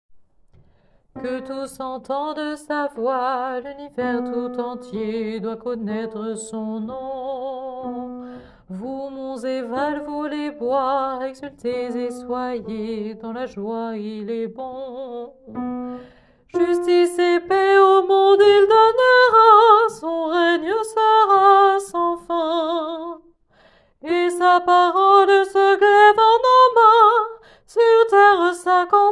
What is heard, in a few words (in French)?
Que tous entendent sa voix alti